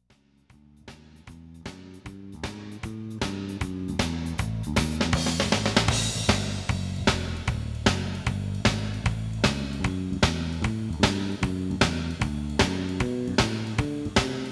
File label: too much reverb, Too much reverb on the snare; Mixing for God audio examples